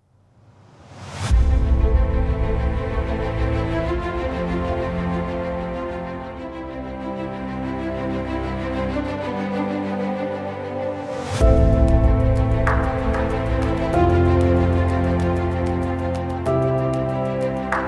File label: Background Music Blockbuster Trailer; for trailer movies